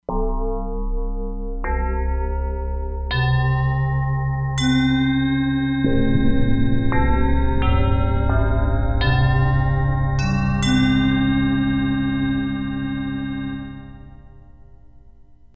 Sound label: Bender Bells, E-mu Proteus-3; Factory patches